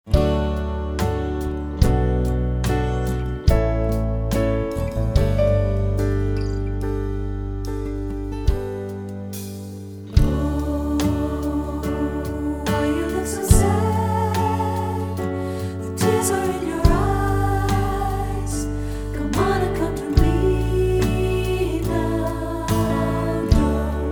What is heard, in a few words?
SATB recording; SAB Popular